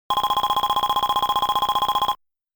Count Up Sound; Gaplus

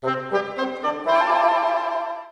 Fanfare Miss; PokePark Pikachu's Adventure; Wii

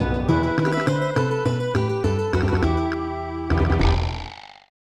Unused Jingle; tin star; snes

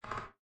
Creak; Lethal Company; Windows

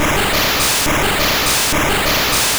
Minimize; Pokemon; Gen 2; attack moves; GSC

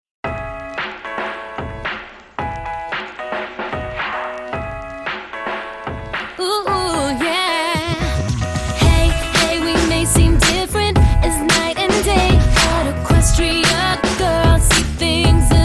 Stomp; My Little Pony Magic Princess; Equestria Girls

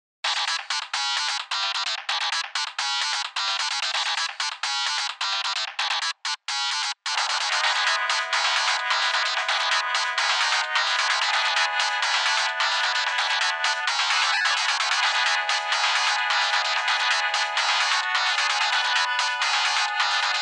ring tone ver; like life original soundtrack; girlslife

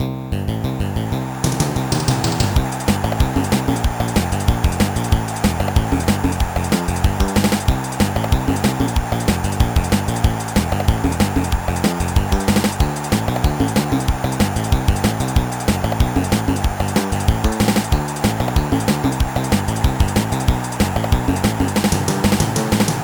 Bonus; soccer kid 2002 gba

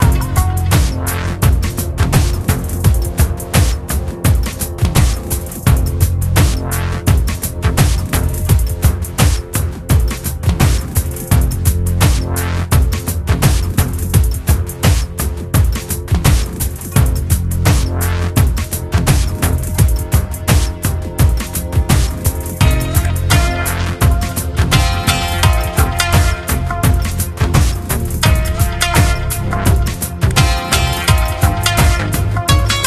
Background Music Emperors Palace; Pixel Gun 3D

